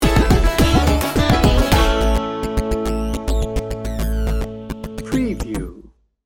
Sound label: hip hop bluegrass sting bumper